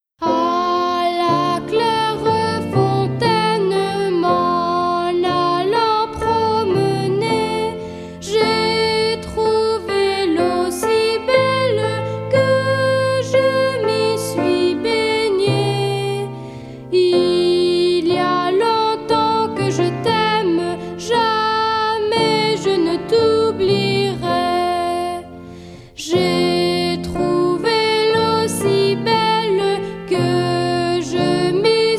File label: Traditional Song - À la claire font; Bien-dit level 1B